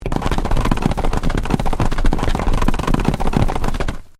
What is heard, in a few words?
Feet Stampede Dirt Cartoon; Cartoon Sound Design; Big Room Sound